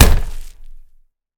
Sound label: Door Close Meat; Game rip; MiSide